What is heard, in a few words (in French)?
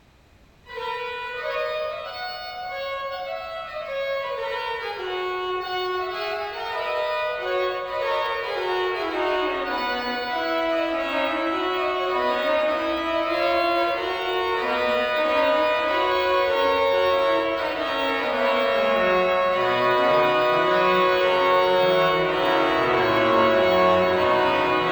fugue anches; dornel